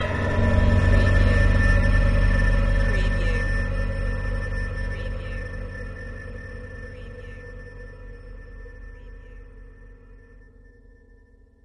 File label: Scare Piano and Metal Stinger